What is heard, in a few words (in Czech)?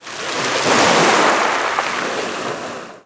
Vlny; wob2 demo